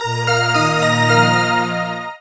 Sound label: level up